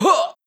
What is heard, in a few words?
combo, onehand sword; assassin